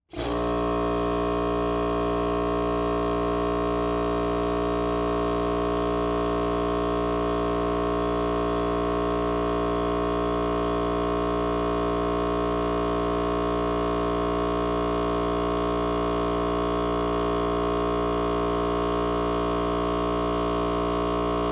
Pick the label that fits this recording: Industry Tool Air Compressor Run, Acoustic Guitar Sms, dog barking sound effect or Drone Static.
Industry Tool Air Compressor Run